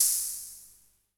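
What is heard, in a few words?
Denon CRB-90 hi-hat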